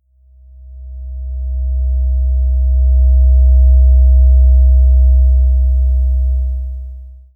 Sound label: Tuning fork 64Hz